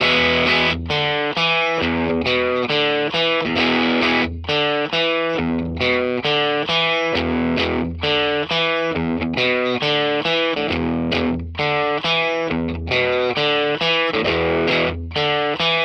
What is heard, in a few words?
EQ Electric